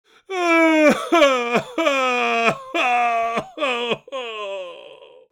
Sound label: Aged man heartbroken sobbing